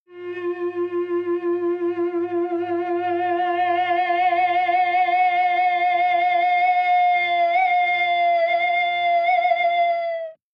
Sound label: Elect Guitar Feedbac, guitars